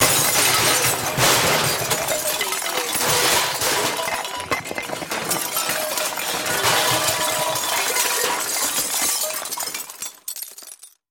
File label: Long Junk Crash; Action, Impacts & Explosions